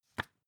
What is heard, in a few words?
magazine page handle